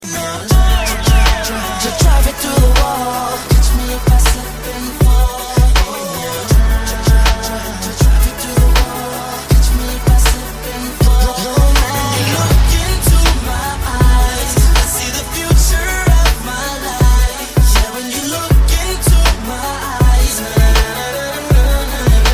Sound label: Slip N Fall